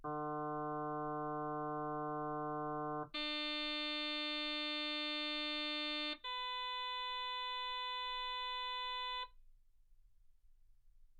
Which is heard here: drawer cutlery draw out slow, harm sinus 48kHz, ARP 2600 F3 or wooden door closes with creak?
harm sinus 48kHz